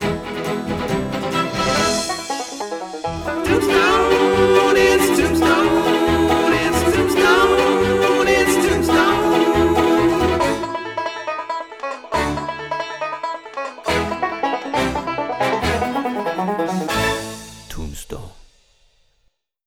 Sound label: Mus Tombstone Sting